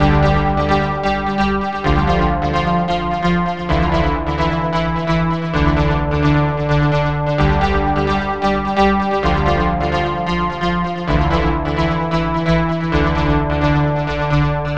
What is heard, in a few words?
NRG Pad; Pads; Trance; Sonic Foundry (Sony Creative Software); Trance NRG VOL-1